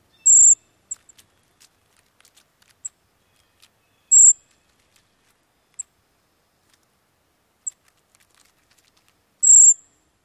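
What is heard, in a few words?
Brown Creeper calls near nest; NY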